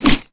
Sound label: brickmove; build mode